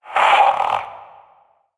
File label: Possessed Red Veil Vocal Death; Warframe; PriestQuest